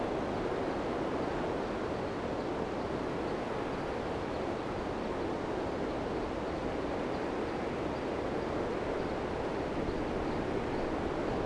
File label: wind italy; ambient; World of Tanks Blitz